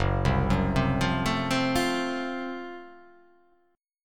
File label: F sharp Minor Major 7th Flat 5th F sharp down